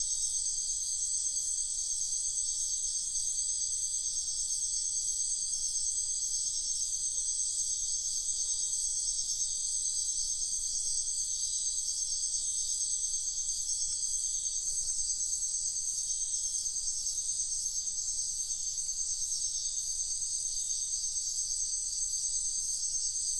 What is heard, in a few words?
nature ambience wetland night frogs crickets insects; sian kaan biosphere reserve; felix blume